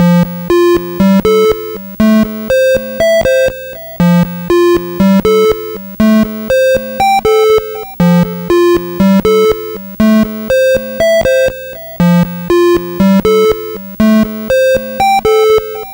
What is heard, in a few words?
Atari 2600 Synth FX; 8-Bit; Chiptune